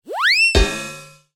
cartoon slip trip fall impact; zapsplat

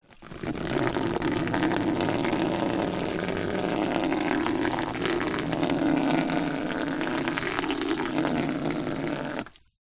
foley water pour onto cardboard

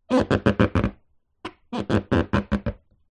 sport surfboard body hand rub squeak

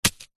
warfare knife stab into soil dirt single

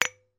foley lightbulbs x2 bang together

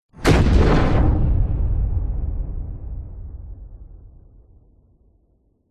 explosions large explosion

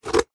office scissors single cut card tube

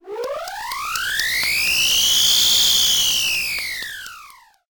sound design digital power up lofi; zapsplat

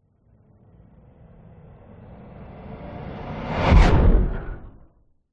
sound design whoosh pass by heavy fast; zapsplat

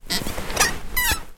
household person movement bum seat

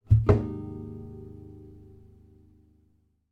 musical guitar nylon string put down

